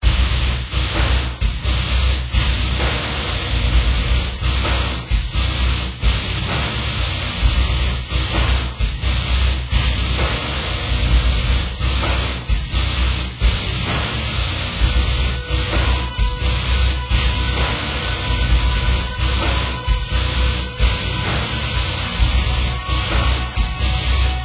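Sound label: Music Ambient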